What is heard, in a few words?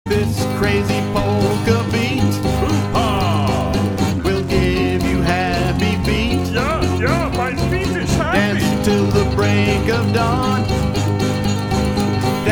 polka